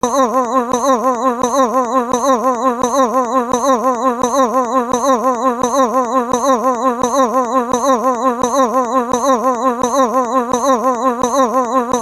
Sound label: aaaaa uuuuuuu gggg ggg hhhhh